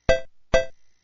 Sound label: Turn Stone; Othello